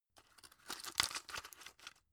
drug put in; berufe; arzt

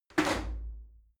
shower slide door open hit regular

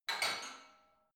barbell only replace reverberant; sport freizeit; fitnessstudio

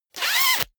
zipper pullover jacket close fast

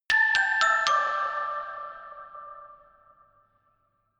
notification glockenspiel creepy sequence descend

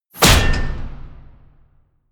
wham, whooshes

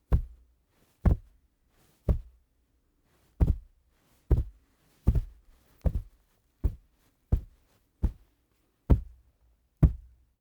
monster footsteps sand; schritte

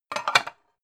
pan stack slide

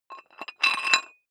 dumbbell thread put on weight soft, fitnessstudio

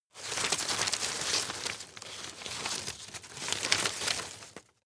rustle hard; berufe; foerster

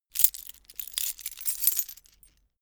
key ring large pick one; objekte; schluessel

